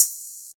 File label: shaker sample a key, Royality free shaker one shot tuned to the A note. Loudest frequency: 8343Hz; percussion